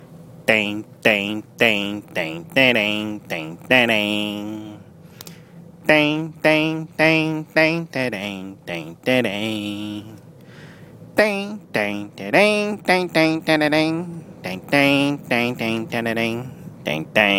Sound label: Vocal